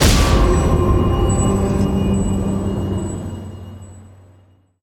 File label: arcane activate; smite; god merlin